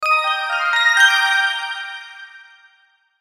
Logo Bell Pad Chime Melody; Blastwave

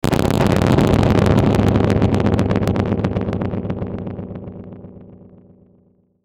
static electric; Static Electric Delay Hits Reverb Long; Production Element Imaging Element Accent Transition; Blastwave

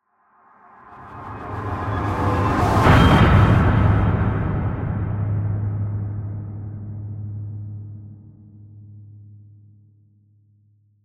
Horror Crescendo, Hit, Metallic, Distortion; Production Element; Imaging Element; Accent; Transition; Scary Tone Sound Effects; BlastWave

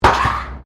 METAL BIN SCRAPE CONCRETE

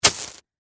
Foley, Plastic, Bag, Marbles, Set, Down; Miscellaneous Toy; BlastWave

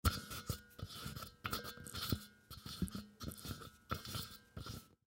Propane Tank Valve Open; Machinery Sound Effects; Machines; Foley; Blastwave

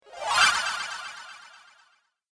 Game Spell Swish, video game

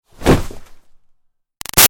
Hockey Hit Body Check Cloth Moves; Sports

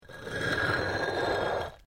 PAVING STONE MOVE, dragging

